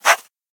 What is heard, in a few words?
paper pen cross out; sonniss gdc